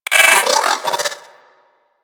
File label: Riot Moa Vocal Attack; Enemies